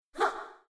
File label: Low Damage VOX; Lust Princess